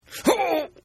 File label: Grunt; Grunts; Voice; Grunt 10